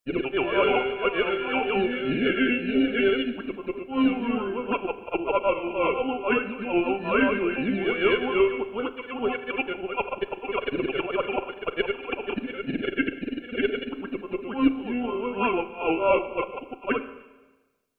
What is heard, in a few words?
fof vowel morph; csound massage